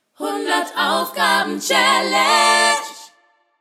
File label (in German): Challenge Jingle; 100 Aufgaben